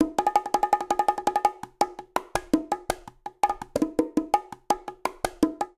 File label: Danzonete Bongo-166bpm; Latin Percussion; Ableton; Factory Packs